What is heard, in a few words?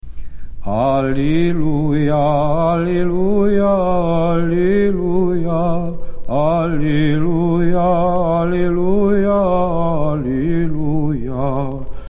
Funeral Alleluia; Papp Irmologion